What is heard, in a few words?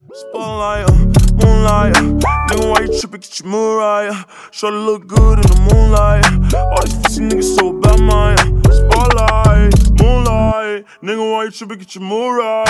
slowed reverb